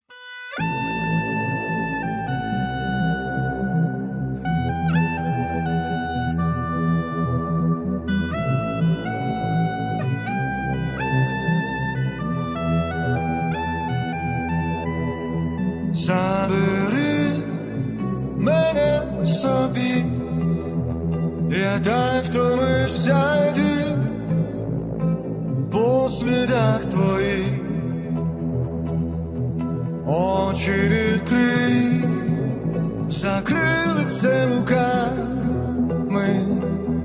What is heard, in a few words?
foley remix